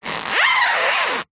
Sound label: vehicle wheel turn tyre squeak road close up isolated clean